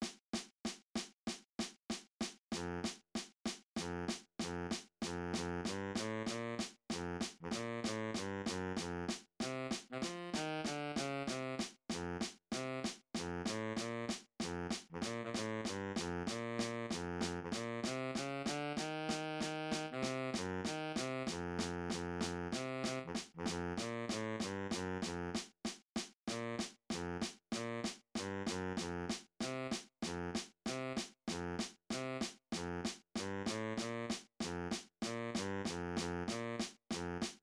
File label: bari sax; cut time